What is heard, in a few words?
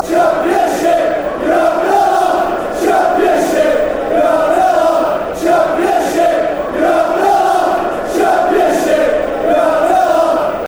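championship yer having laugh, football songs and soccer chants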